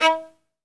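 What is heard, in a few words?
SOLO VIOLIN, Synclavier Strings; ILIO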